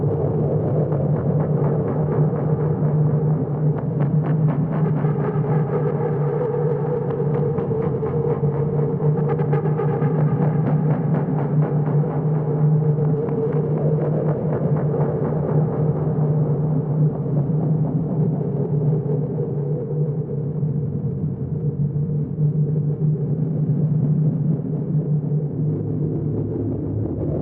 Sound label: Abandoned Station Light Loop, Horror Atmospheres Music